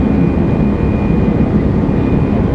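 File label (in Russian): шум самолетного двигателя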